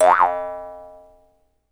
jaw harp

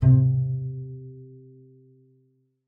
C3, bass pizz; Xsample VOL-5

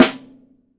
12 SNARE, MAPLE SNARE

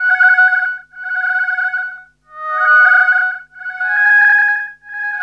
SYNT; USB Soundscan vol.47; AKAI; Hip-Hop & R&B

